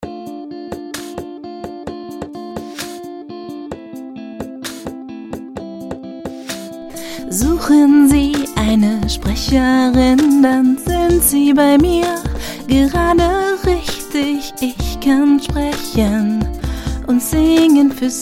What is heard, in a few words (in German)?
Werbesong